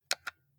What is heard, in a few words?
console controller xbox xyab press